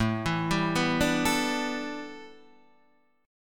A 9th Suspended 4th Guitar Open D, chord

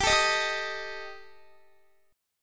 G Suspended 2nd Sharp 5th, Guitar; Gsus2#5; strummed; x,22,19,20,19,22

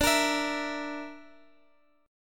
D Suspended 2nd Sharp 5th D, Guitar, Open G

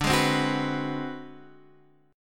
G sharp 7th Sharp 9th Flat 5th

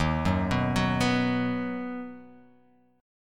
D sharp Minor Sharp 5th; x,6,4,4,6,6,x,x; chordshape

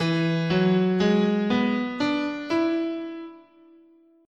E Dominant Pentatonic, guitar, scale

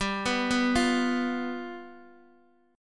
G 7th G down Guitar DADGAD

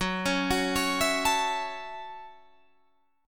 F sharp Minor 7th F sharp, Guitar Open D

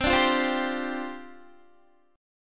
C Suspended 2nd Suspended 4th, down, Guitar

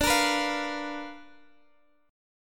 D Minor 6th Sharp 5th, guitar chord

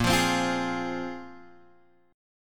A sharp 7th Suspended 2nd down Guitar Open G

